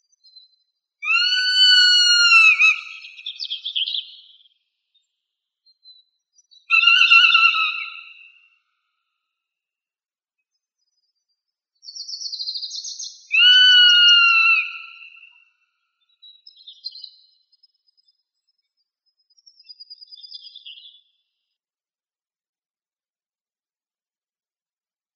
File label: Birds of Prey; ZTHA